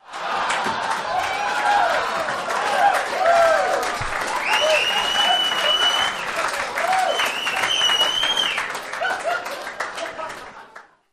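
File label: Applause Laughter Whistles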